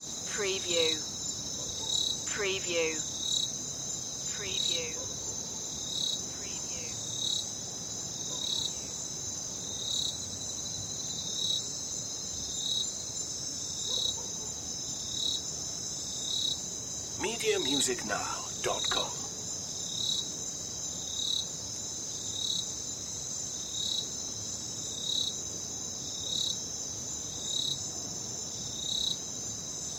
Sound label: night crickets